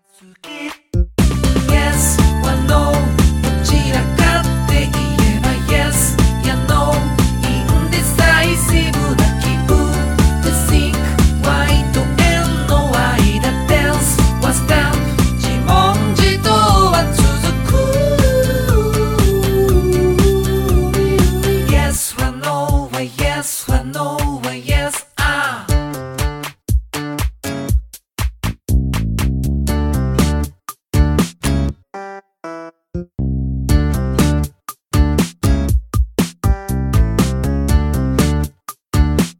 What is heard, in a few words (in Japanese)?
ロック